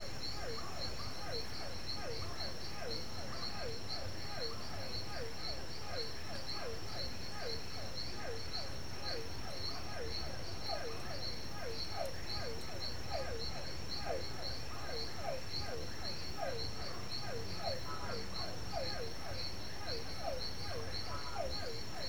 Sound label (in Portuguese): Sapos diferentes, cigarras, grilos no mato a noite com chuva no fundo; animais; Coleção Sonora do Cerrado